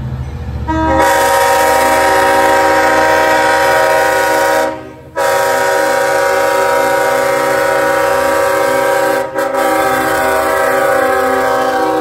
departing, train horn; Glen Head June 2019; lirrhorns

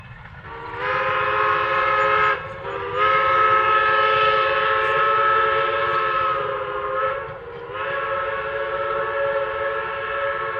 G5s whistle, steam; G5s 39

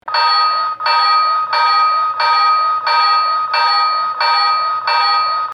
M7 bell, trains; St Maarten Trains

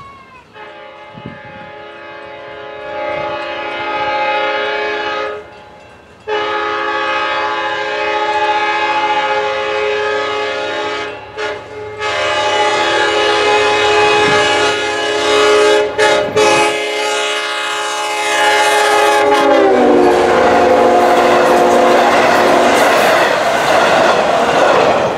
roaring through, K5LL, horn; Bethpage July 2018; lirrhorns

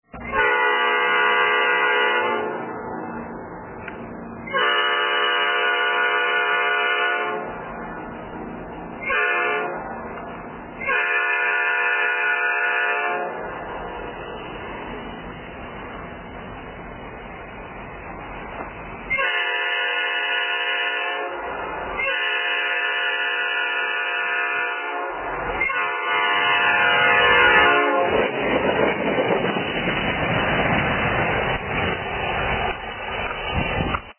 EMD GP38-2 Nathan P5A horn; nyar lirr; mixed cast; lirrhorns

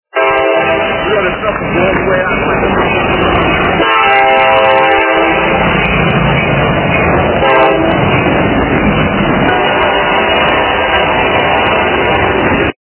lirr mp15ac k5la old cast; nathan; lirrhorns

